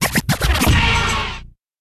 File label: win; SamsonGame; wins loses